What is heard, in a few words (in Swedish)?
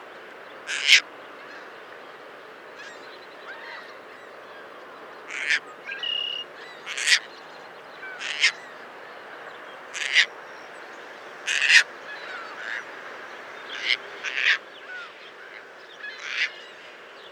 Skrantarna